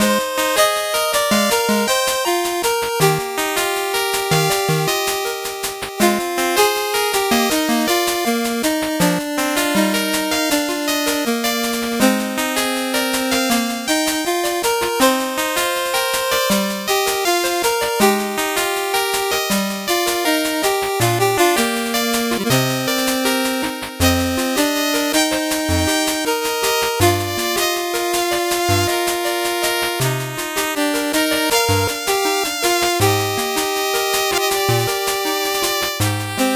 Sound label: 8bit bgm slow